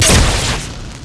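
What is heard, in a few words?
bfg fire, weapons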